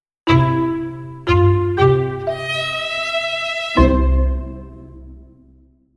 JINGLE VIOLIN

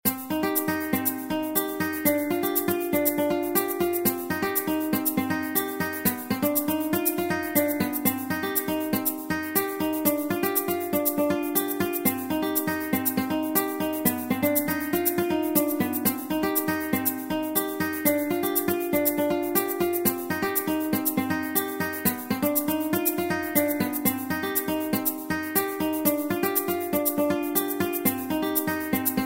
SPANISH GUITARE

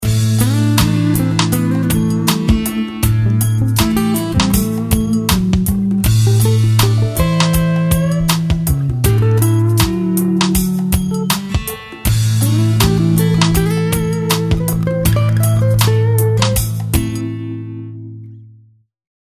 bluesy strings groove

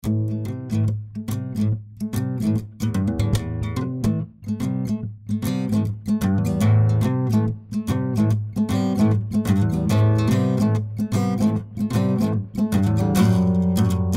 BLUES

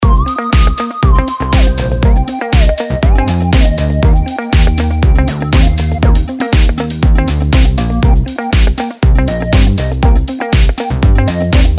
FUNKY ELECTRO POP

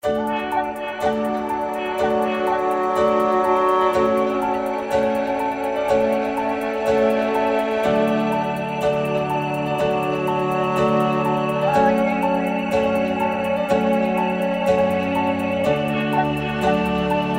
STRING CONNECTION

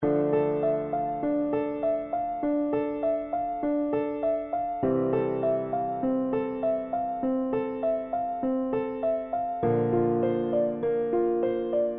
SAD WOOD

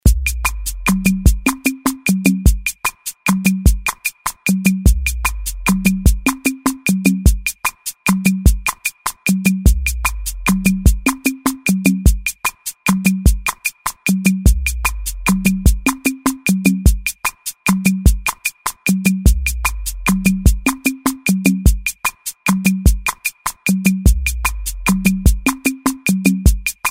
DRUM

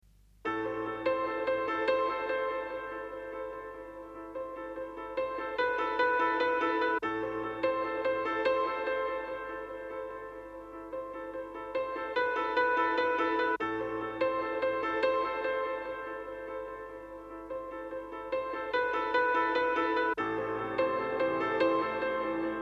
PIANO

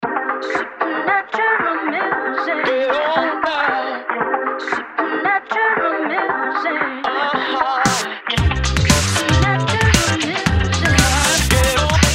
ELECTRO DISCO FUNK TWISTED

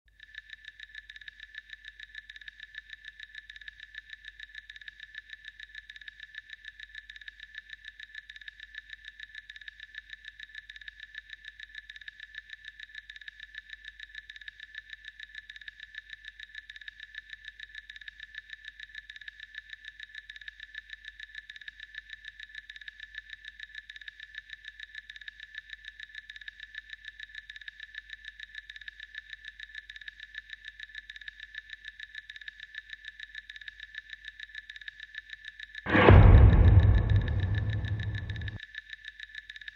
FX DRUMS

